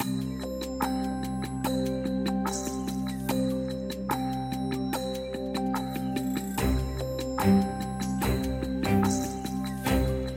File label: BGM